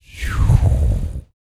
VOX FX, BREATH FX

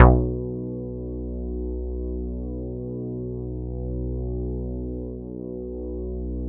Synths BASS

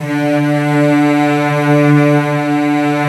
strings D2

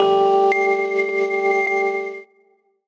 VIB WHIZ; Rawcutz; Nu Forms

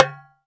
Oct Tom, Roland SPD-S

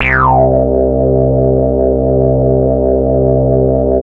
Anasound Long mid C, Analog Single Notes

